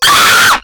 Murder Scream Loud

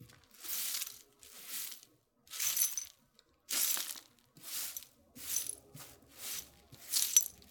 Glass Sweep Sweeping Shattered Glass

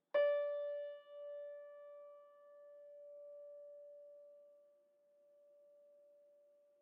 Piano Note

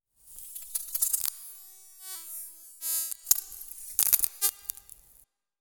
Electronic Insect Droid Destruct Fx

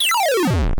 8 Bit Pitch Descalation Effect

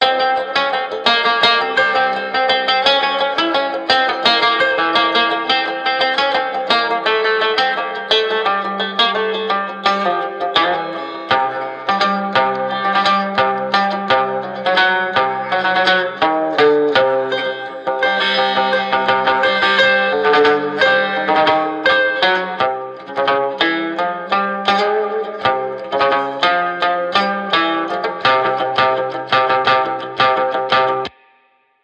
Egyptian Music

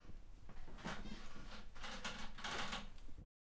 Bed Creaking

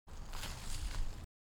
Eating Snack

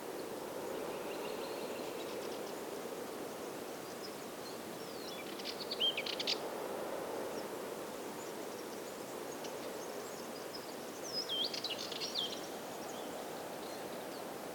Windy Forest Stereo

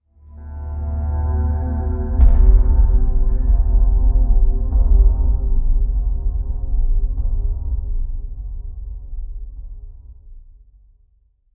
Psychedelic Bass Rumble

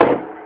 Thud Hit

